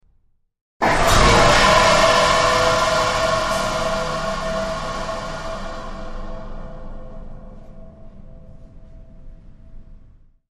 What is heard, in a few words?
Shock Fire Warning B